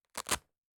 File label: Velcro Clothes